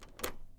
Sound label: Key unlock; Phasmophobia